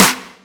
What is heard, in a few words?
soft hit clap; osu